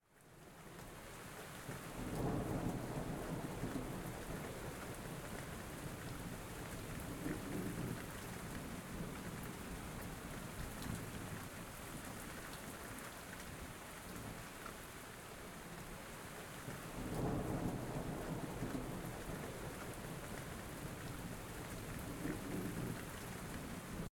Tempestade - Storm; Super Mombo Quest

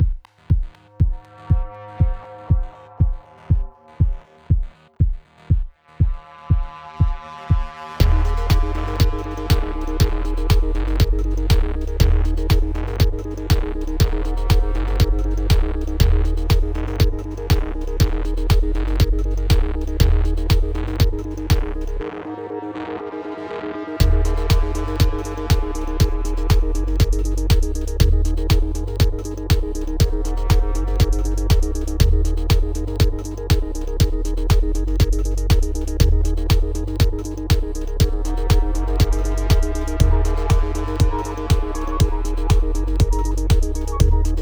Mechanical

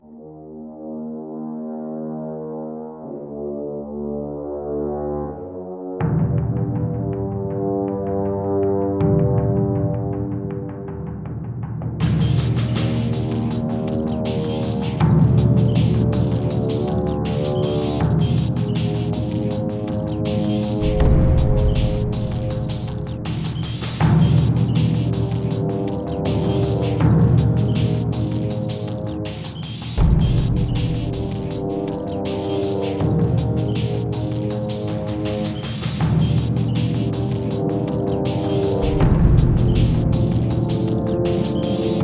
Factory Ambient; Global Agenda

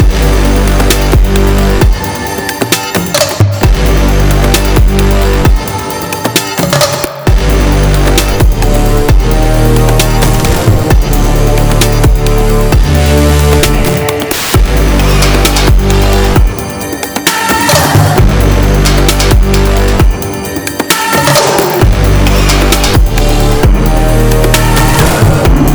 Danger Loop 132bpm

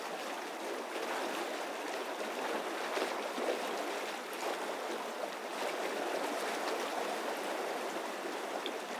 amb boat

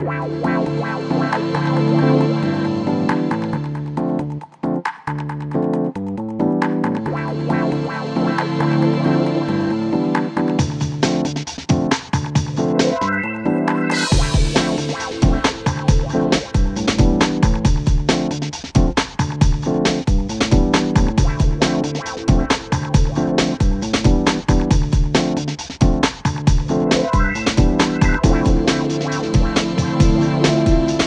Chill; dark summit; gc ps2 xbox

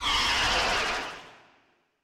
Eyeboss Thorn Scream; Super Mombo Quest

